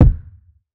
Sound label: Flame Kick; Kicks